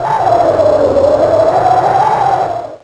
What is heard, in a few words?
Ice Burn; pokemon reborn e19 2022